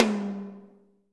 roomy tom single hit g key; tom drum samples

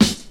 2000s hip hop steel snare drum sound g sharp key

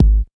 sharp bass drum sound g sharp key

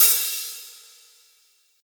big room open hat one shot c key

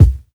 strong bottom end bass drum single shot c key

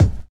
raw steel kick drum sample a sharp key

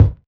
large room steel kick drum sound g key

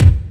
nineties raw kick drum f key; kick drum samples

